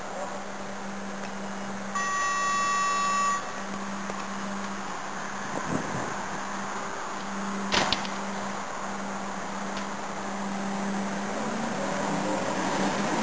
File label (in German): 4020 abfahrt, eisenbahnsounds; oebb